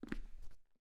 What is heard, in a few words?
Step on wood